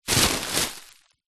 plastic bag bin liner full of soft clothes or pillows push pressure apply; zapsplat; foley